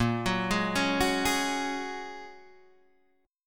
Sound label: Bb Minor 7th Sharp 5th, Guitar, DADGAD; 8,9,6,6,9,6